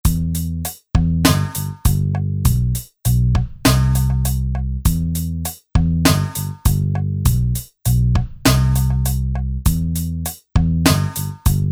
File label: Reggae